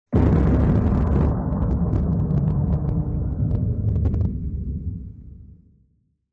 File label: explo